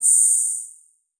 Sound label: Animation Short; Multimedia Internet CD-Rom Flash